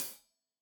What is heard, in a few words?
Hat Tight, Hi-Hat; Deeply Sampled Drums